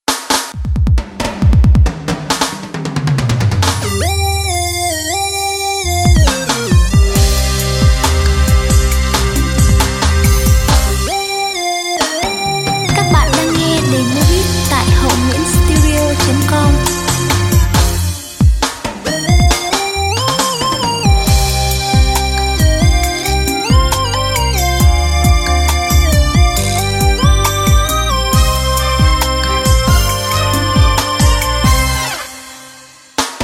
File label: beat, playback